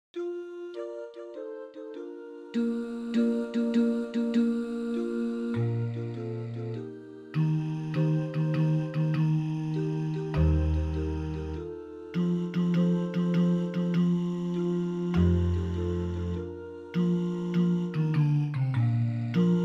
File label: Basses; Pokarekare Ana